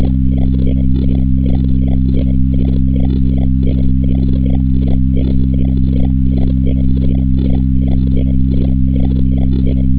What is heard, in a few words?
Steam ship